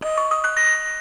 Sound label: Colors Notes, toys